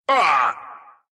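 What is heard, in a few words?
pain, Russian; Zuus arc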